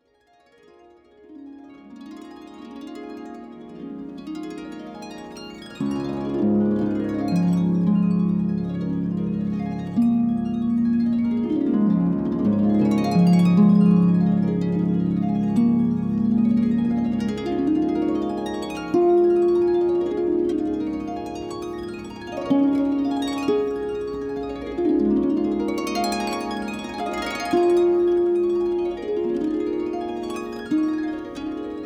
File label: Healing Harmony, harp; each note activates a specific chakra